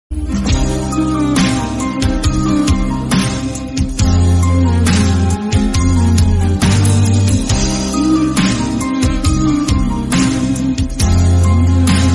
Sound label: anegan remix bgm ringtone